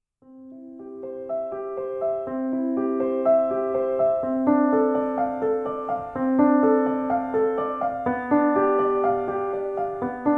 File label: classical piano